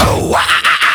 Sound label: ooh wah ah ah ah